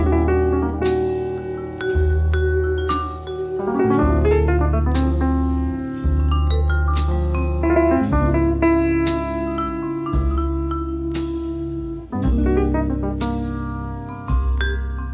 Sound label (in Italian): night jazz per vibrafono